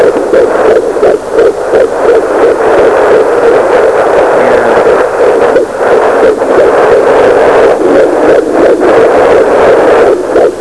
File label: HeartBeat short